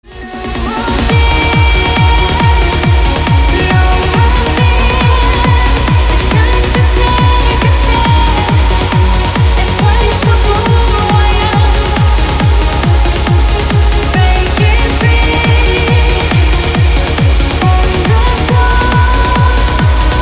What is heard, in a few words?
remix